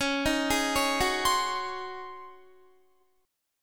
C sharp Major 7th Suspended 2nd Suspended 4th Guitar; C#M7sus2sus4; arpeggiated